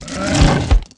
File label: Death, Jaldari Mutant, Aliens